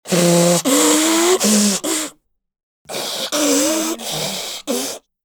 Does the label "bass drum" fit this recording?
No